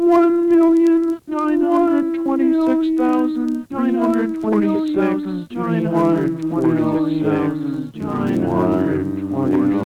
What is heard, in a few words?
resynth